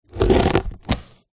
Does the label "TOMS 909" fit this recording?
No